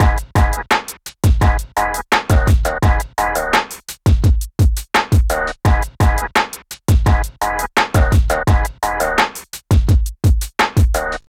HipHop Drums Synth Combo G# Min; Digidesign; Pro Tools Essential Loops

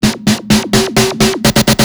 130 Garage Drum Fill; Dance DJ; Drums; Garage Breaks; Pro Tools Essential Loops; Digidesign

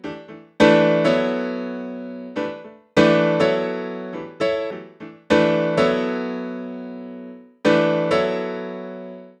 NuJazz Piano E; Digidesign; Pro Tools Essential Demos; Essential Loop Demos; Demo Session - Jazz

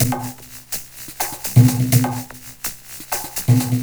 125 Dance Perc; Percussion; Pro Tools Essential Loops; Digidesign

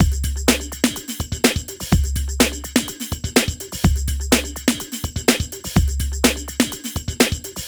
125 Pop Tamb Beat, Fast Tempo; Digidesign; Pro Tools; Pro Tools Essential Loops; Pop Rock; Drums

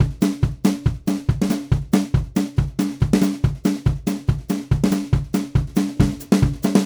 Country Drums, Pre Chorus; Digidesign; Pro Tools Essential Loops

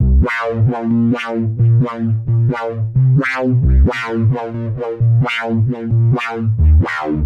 132 House Arp Cmaj; Dance DJ; Synth; Arps; Pro Tools Essential Loops; Digidesign

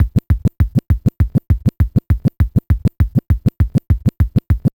Vinyl Scratch Kick

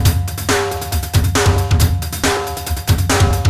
137 Garage Ringing Drum Loop; Dance DJ; Drums; Garage Breaks; Pro Tools Essential Loops; Digidesign

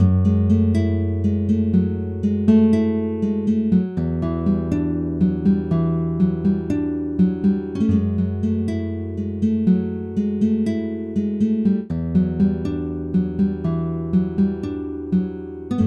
Pop Ac-Guitar Arp Dbmin; 060; Pop Rock; Acoustic Guitar; Pro Tools Essential Loops; Digidesign

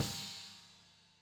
Snare; Pro Tools Essential Demos

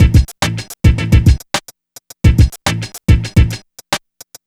HipHop Break Combo A min; Digidesign; Pro Tools Essential Loops

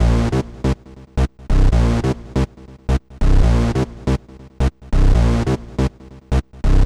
140 House Bass Cmin; Digidesign; Pro Tools; Pro Tools Essential Loops; Dance DJ

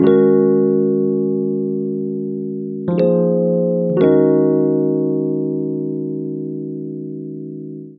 120 NuJazz _Ebmaj, E-Piano; Pro Tools Essential Loops; Digidesign

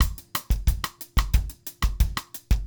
090 Latin Jazz Sidestick, Drums; Pro Tools Essential Loops; Digidesign

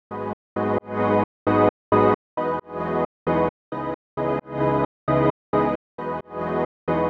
House Pulsed Synth C#maj; Dance DJ; Pulsing; Digidesign; Pro Tools Essential Loops

